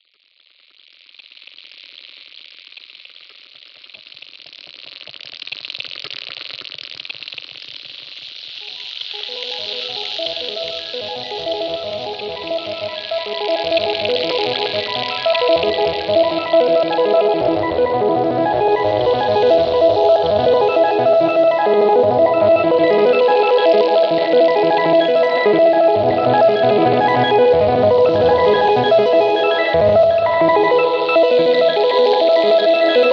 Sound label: Ambient music